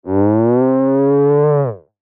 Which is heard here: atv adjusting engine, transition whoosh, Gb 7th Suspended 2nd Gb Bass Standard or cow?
cow